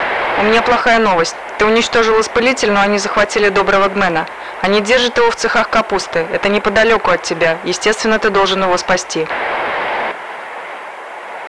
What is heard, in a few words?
radio